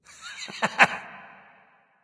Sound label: "heh heh"; smite; voicepack; ullr